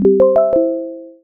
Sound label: Task Complete; Emberfall